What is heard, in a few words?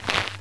step; Notrium